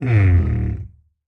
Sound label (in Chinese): 嗅探兽待机声